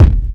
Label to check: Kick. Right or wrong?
Right